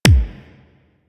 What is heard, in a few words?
SMG Punch, weapons; Protea